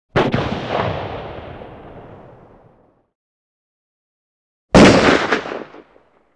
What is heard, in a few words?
Large Guns Fire 2 Versions